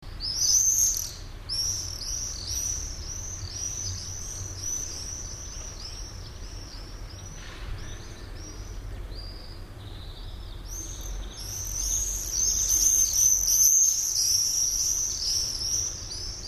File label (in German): Mauersegler